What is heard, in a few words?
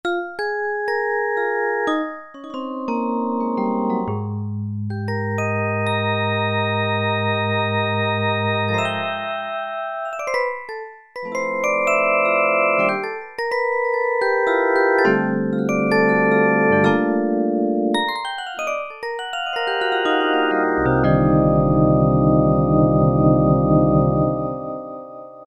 Vibrations, E-mu Pro-Cussion; Factory ROM kits